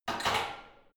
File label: barbell heavy plates replace rack soft; sport freizeit; fitnessstudio